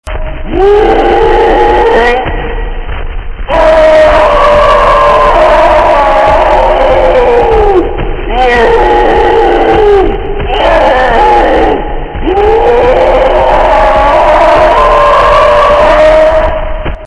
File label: horror